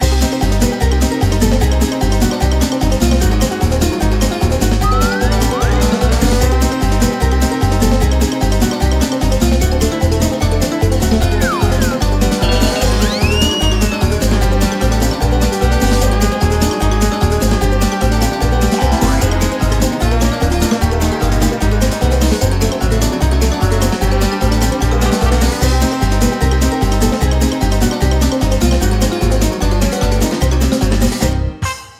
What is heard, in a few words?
Trap Loop